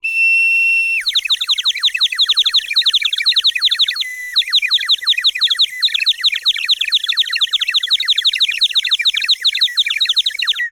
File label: Bird whistle, clay, painted, water, airy, wispy, flurry, sustained note, frenzy, frantic, blitz; very strong; Eiravaein Works; Vaeyan IV